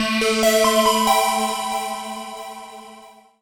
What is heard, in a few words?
TECHNO SYNTH 140; Keys